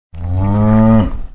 single cow

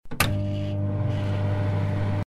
microwave oven start

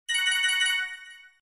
multimedia game star win gain x4; zapsplat

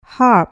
harp

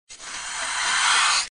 snake hiss; short

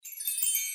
sfx magic

